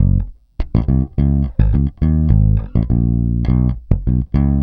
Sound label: Pop, Bass